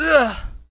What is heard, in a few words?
hurt